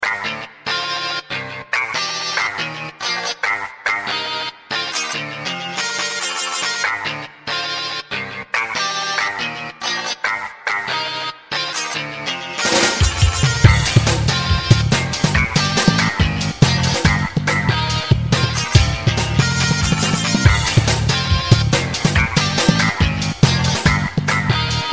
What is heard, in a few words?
instrumental